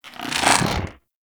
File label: Head Pull; Warframe; Teralyst; Ropalolyst; Flight